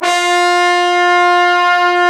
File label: BRS LO BRS, Brass Sections, BRS_Fat Pop Sect; Roland LCDP06